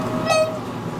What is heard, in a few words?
mlem cat